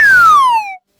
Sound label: slide whistle down